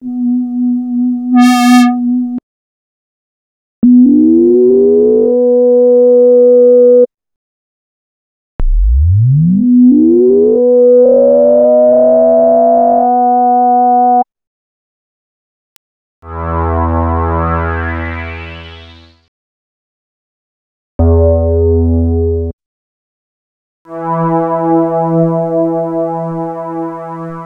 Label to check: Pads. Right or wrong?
Right